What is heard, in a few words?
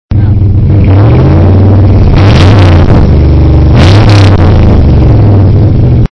truck